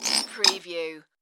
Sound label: Tui Croak Tweet; Bird sound effects; Bird sound effects including birds chirping and squawking